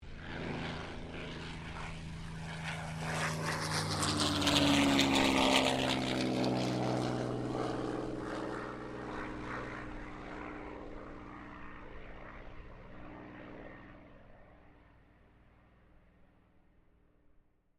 AIRCRAFT CA-18 MUSTANG TAKEOFF; P51 /CAC-18 Mustang; Airplanes; Vehicles

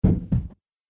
Body Slam Impact, wrestling and struggling

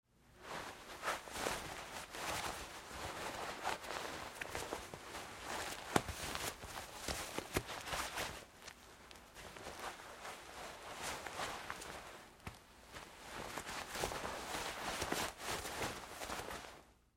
Foley Fight Moves Nylon Shorts Struggling; Wrestling And Struggling

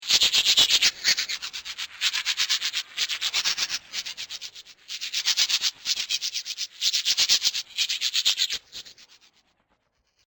Alien Brushing Teeth; Alien Sound Effects; science fiction sound effect; Andrew Fleming

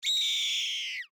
Hawk Screech, Animal, Hawk, Screech, Red, Tail; Birds; Animals; BlastWave

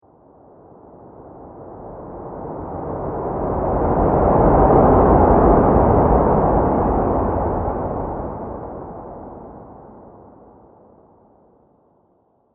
Rumble Whoosh Strong; sound design

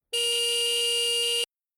Motorbike Quadbike 400Cc Horn Single Beep; Transport; Atv; ATVs

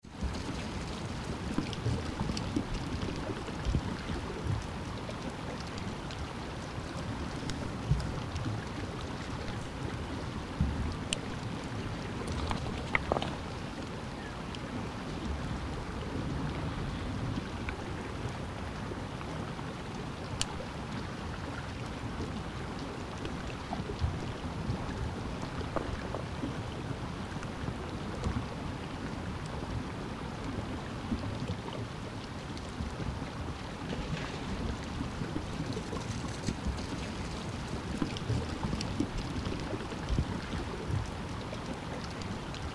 Ocean Sea Semi Frozen with Ice Pack Moves; Heavier water moves between ice sheets; Ocean Sound Effects

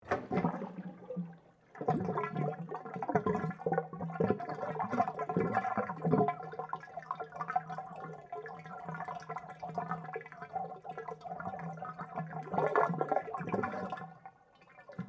Water Drain Tub, Large; Water Sound Effects; Water (Sound Effects:Nature); BlastWave

